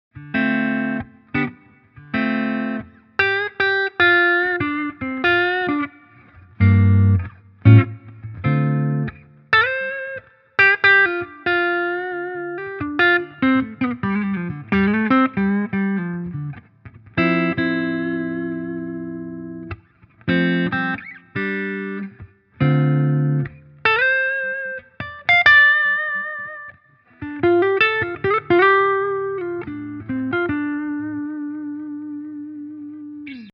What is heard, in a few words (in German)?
Gibson Les Paul Tribute Hals clean